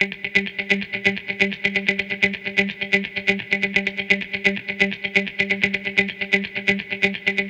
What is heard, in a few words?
Electric Pop Guitar 128BPM G; Electric Pop Guitars; Vengeance - Essential Clubsounds Vol.4; Guitarloops